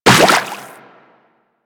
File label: Run Water, Footsteps; Warframe; Teshin